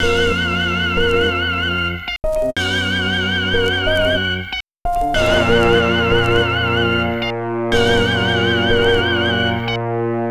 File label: Melody, Hip-Hop